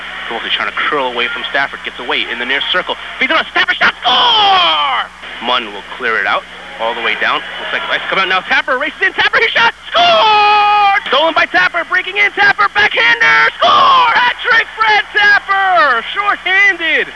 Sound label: tapper